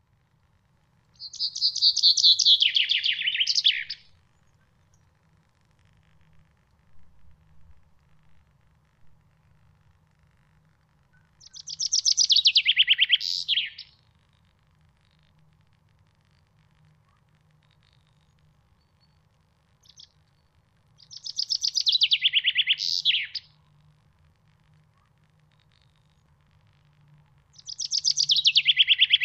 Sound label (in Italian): Fringuello